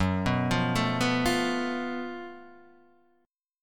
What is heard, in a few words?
F sharp Minor Major 7th Double Flat 5th; F#mM7bb5 Chord {2 2 3 2 0 1} arpeggiated